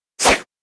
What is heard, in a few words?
whoosh